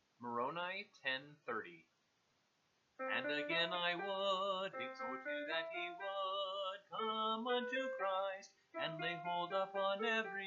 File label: vocal